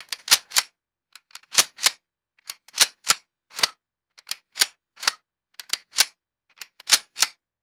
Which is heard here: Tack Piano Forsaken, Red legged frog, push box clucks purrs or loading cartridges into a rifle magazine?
loading cartridges into a rifle magazine